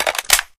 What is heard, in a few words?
awp clip in; weapons; Left 4 Dead 2